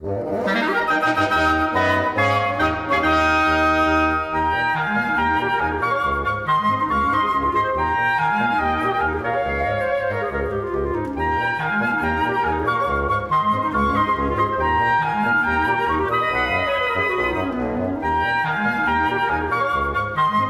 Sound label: victory, woodwind quartet